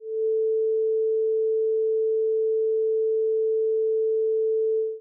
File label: sine tone a441; music theory